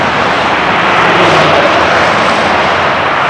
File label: car pass; trasporti; auto